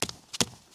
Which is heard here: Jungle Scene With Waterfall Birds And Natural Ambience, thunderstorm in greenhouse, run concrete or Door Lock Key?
run concrete